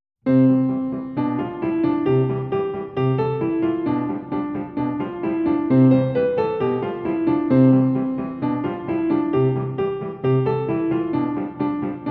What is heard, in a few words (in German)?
Cancan Gebrochene Akkorde in Achtelnoten